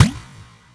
match notready; Chitanda Sagiri+blue angel+changed version

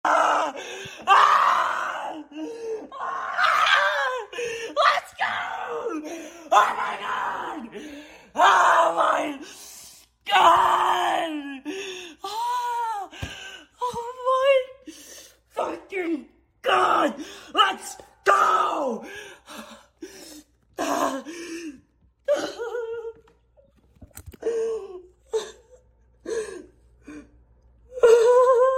applause; hr + hr hard hs

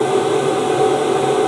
spinner spin; [EZ] Blueberry (ekoro edit)

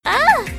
combo break; Ameliorate v1.5

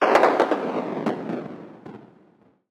fail sound; [DT] Rafis HDDT f5

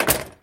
menu back

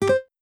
match start

